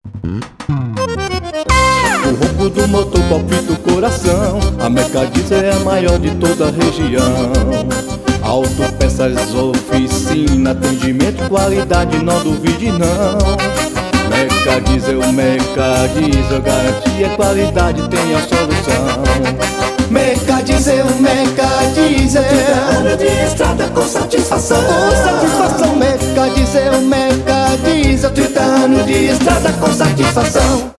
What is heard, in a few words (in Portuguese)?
jingles